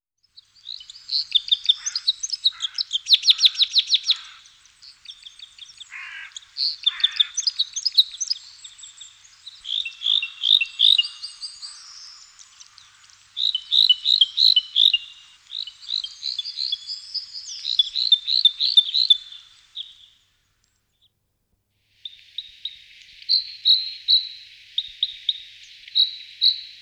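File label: Loxia pytyopsittacus, passeriformes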